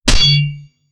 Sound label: sound effect, block